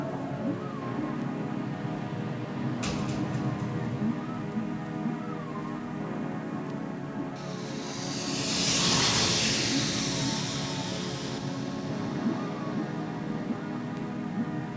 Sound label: untitled ambient chillout mix